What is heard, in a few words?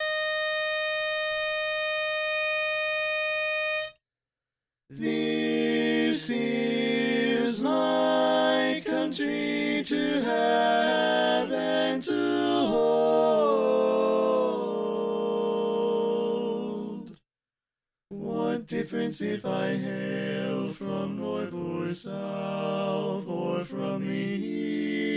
Bari Left